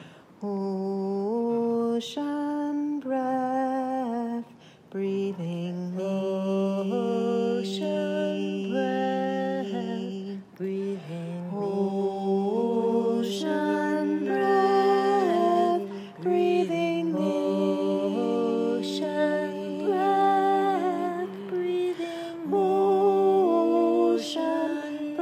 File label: Round